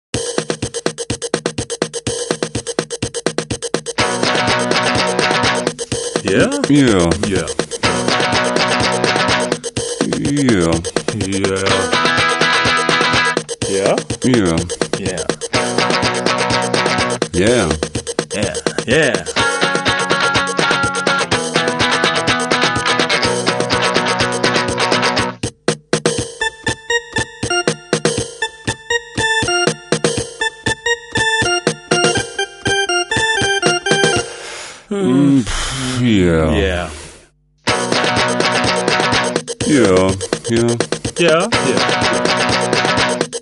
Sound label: Jingle; Parapop